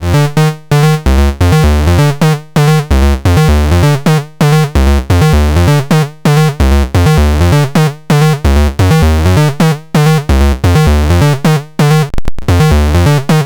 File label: Multi Function Discrete VCO LIN FM; ACL